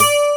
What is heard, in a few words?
12STR, AC.GTRS